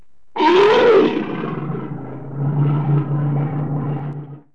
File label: bear sounds